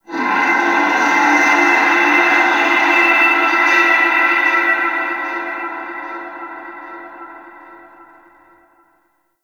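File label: Shipyards distant metal bend, Ambience; Warframe; Grineer Shipyards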